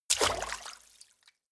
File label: step water run in